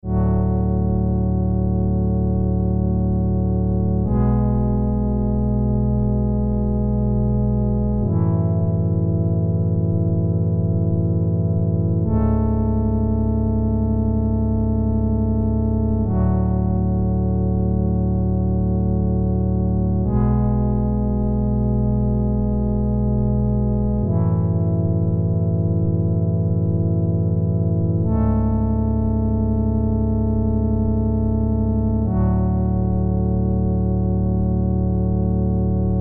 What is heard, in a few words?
Dreampad